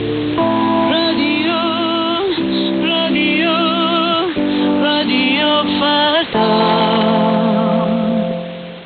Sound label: jingle; r farda